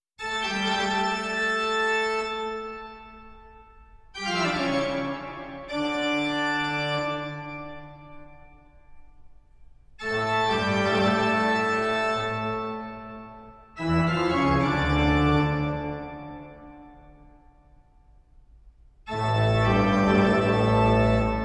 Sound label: Toccata and Fugue in D Minor, Toccata; BWV 565